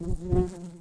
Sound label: Humilis workers, bumblebees